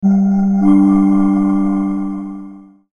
Logo Two Note Low-Fi; Sonic Logo; Blastwave

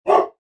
Dog Bark; Dogs (Sound Effects:Animals)

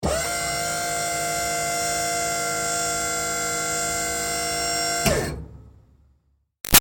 Servo Moving Truck Long; Motors; Machines; Big Room Sound

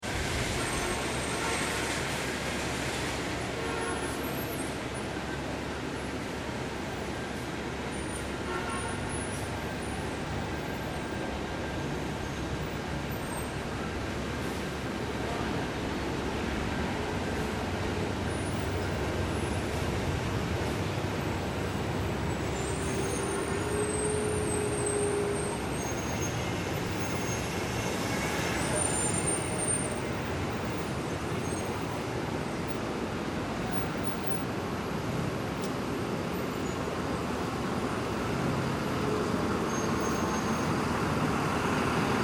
Urban Traffic Brake Squeaks Long: Taxis and; buses idle and drive away. Heavy, slow moving traffic; Urban Traffic Lax Brake Squeaks Long; Traffic And Car Sound Effects

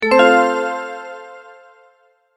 multimedia tone advance; Tones (Sound Effects:Sound Design); McKinney Sound

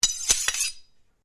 Blade Swish Low, Sword

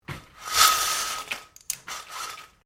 Blinds Venetian Lower Quickly, Household blinds; Living Room (Sound Effects:Household)

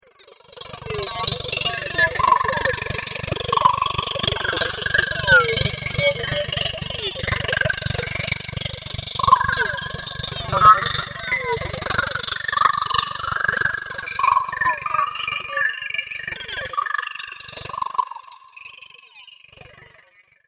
Ambient Alien Data; Alien Sound Effects

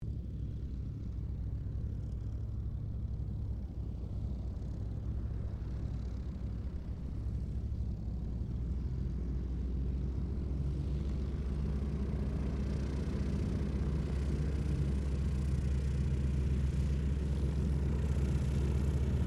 AIRCRAFT SUPERMARINE SPITFIRE MK-XVI TAXI STEREO

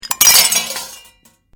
China cups dropped metal tray; china cup breaking; Drops; Objects

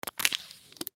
Coffee Creamer; Coffee Creamer Package Lid Tear Off; Foley Coffee Creamer Package Lid Tear Off Plastic Container; Drink Mixing; Blastwave

